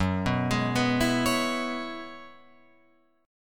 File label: F sharp 9th Suspended 4th, down, Guitar, Open F; arpeggiated